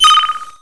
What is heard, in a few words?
time count beep